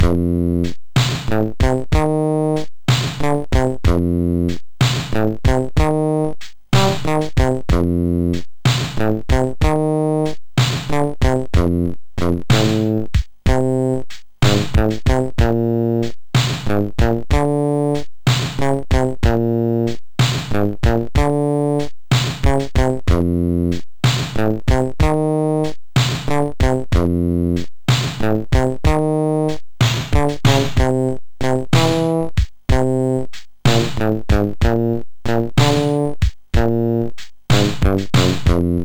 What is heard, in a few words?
F Blues